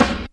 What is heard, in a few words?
SD, Dance Drums